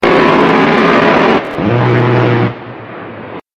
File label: a-10 strafing run